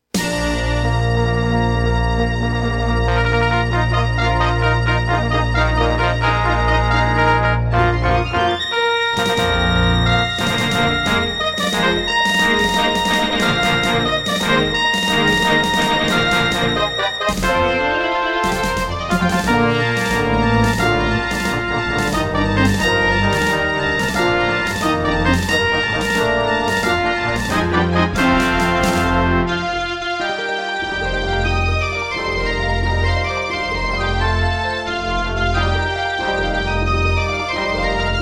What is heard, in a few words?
Intro, Serdaco Dreamblaster S2; Dark Forces